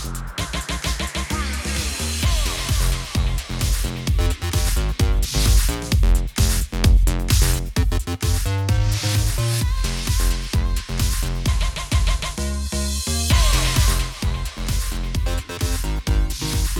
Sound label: Sizzle Music; Upfronts 2015; 2015 TCA Sizzle